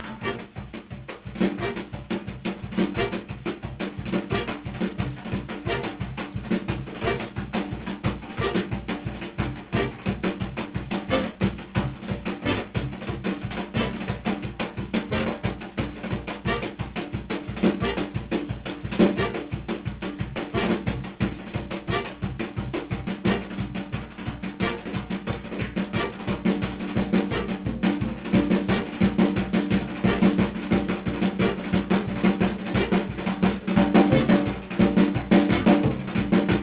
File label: brush stomp, drummer